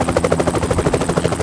HELI, sfx; urbanchaos

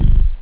square; OctaMED SoundStudio V1

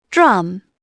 drum; Dorling Kindersley My First Incredible Amazing Dictionary

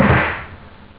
live, drums snare; OctaMED SoundStudio V1

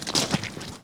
step; wob2 demo

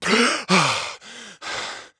gasp; Heretic II Demo

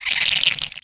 flee, beetle; Heretic II

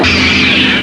missile; Uprising

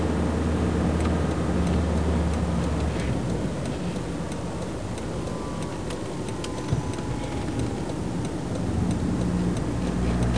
taxi

